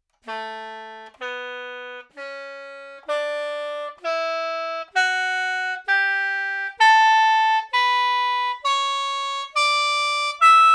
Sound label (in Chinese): 传统笙 音阶 中速 力度强